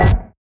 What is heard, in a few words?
burst; Watcher